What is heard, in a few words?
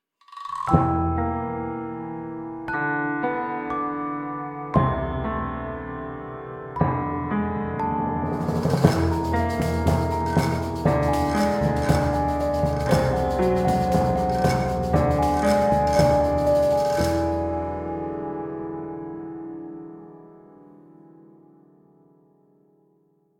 gameplay stinger bad; curious expedition 2 macos ps4 switch windows xbox one xbox series xs gamerip 2021